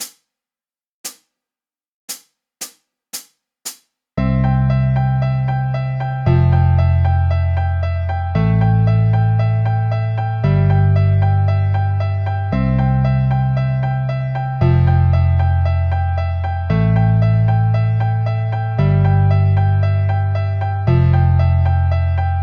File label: (Instrumental)